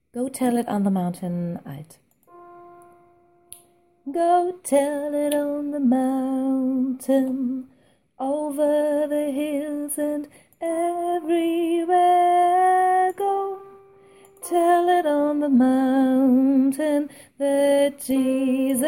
Go tell it on the mountain alt, gospelchor

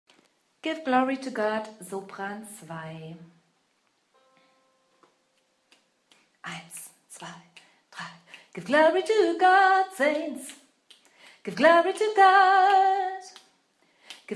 Give Glory To God Sopran, gospelchor

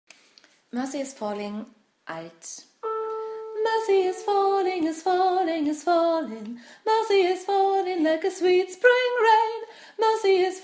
Alt, gospelchor

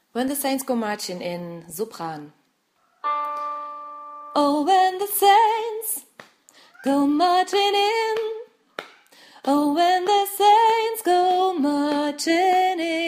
When The Saints Sopran, gospelchor

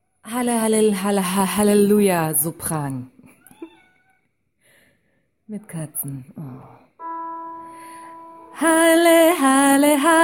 Halle halle hallelujah sopran

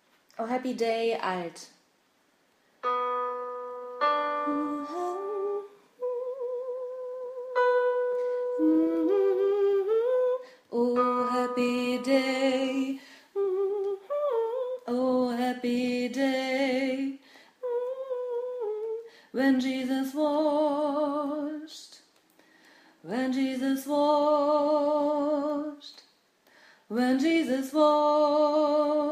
Oh Happy Day Alt, gospelchor

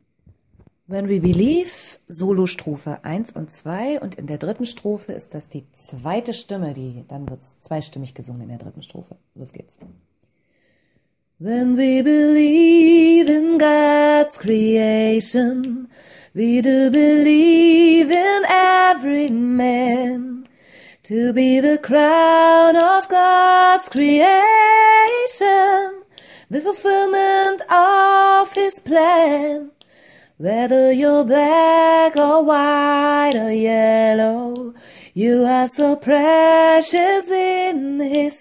When we believe solo

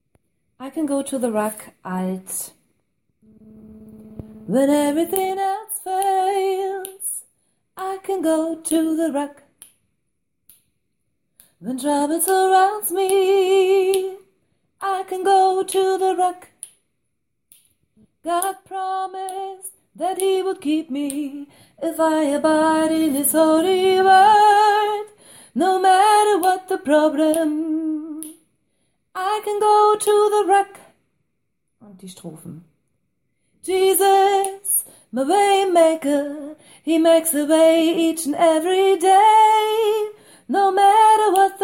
I can go to the rock alt, gospelchor

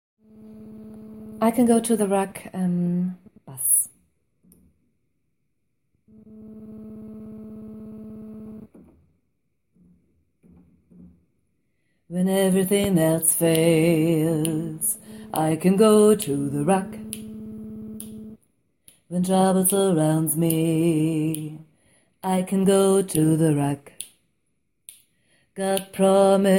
I can go to the rock bass, gospelchor

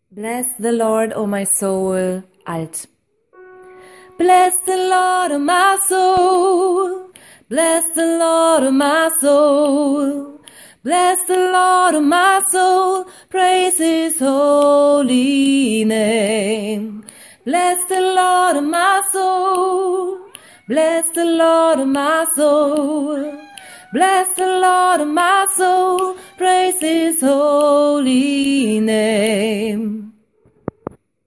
Alt, gospelchor

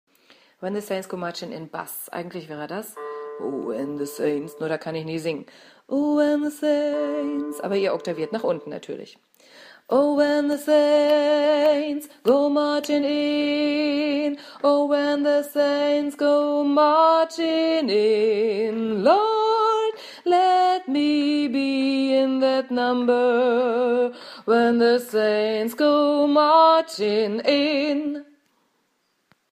When The Saints Bass, gospelchor

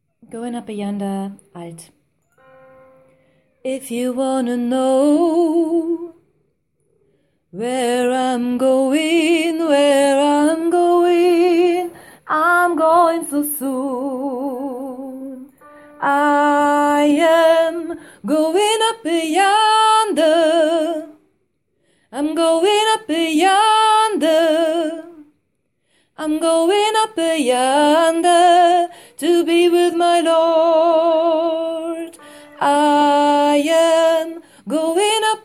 alt, gospelchor

